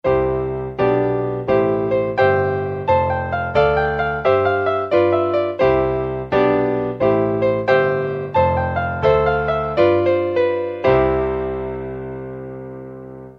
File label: grand march, piano